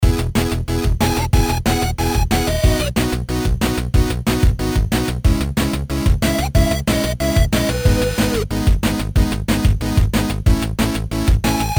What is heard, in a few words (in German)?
Telefon